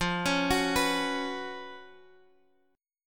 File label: Gb Major 7th Suspended 4th F